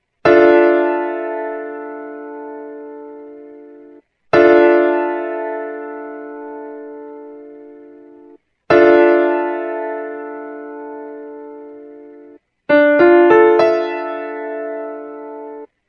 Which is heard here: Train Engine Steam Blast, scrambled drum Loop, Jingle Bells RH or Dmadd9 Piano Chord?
Dmadd9 Piano Chord